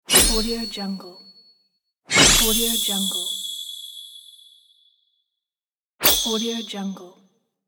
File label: anime sword shine